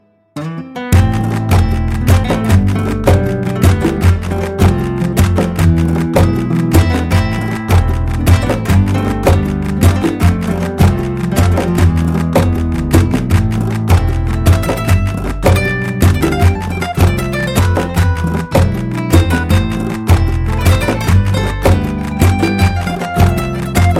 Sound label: spanish acoustic guitar